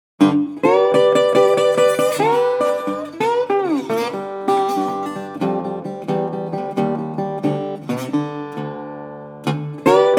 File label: Blues